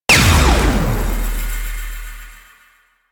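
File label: Ability Interrupt Explosion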